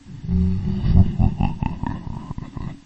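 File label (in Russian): жуткий смех одиночный низкий мужской жуткий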